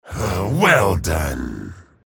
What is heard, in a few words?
hellknight pain ability damage; low health